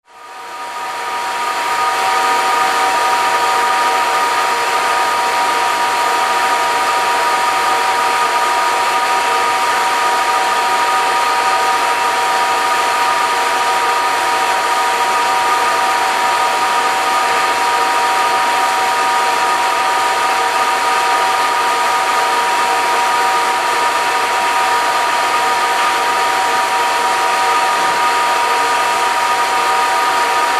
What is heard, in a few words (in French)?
Ambiance Sonore Commutateur MT20, Commutateurs Électroniques Temporels 2G; Ambiance sonore d’un Commutateur MT20 en fonctionnement.; Commutateur BONDEVILLE 1 CTU (RU71); Signalétique typique de Commutateur MT20.